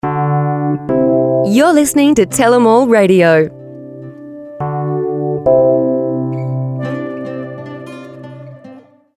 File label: SWEEPER